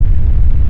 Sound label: Noise Sound; bloodungeon